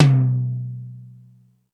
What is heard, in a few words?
Toms, E-MU Pro-Cussion